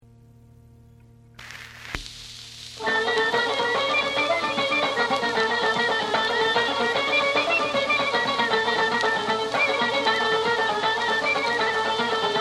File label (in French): Shalakho danse, Ensemble d'État d'Arménie; Direction Tatoul Altounian